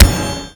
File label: kill sound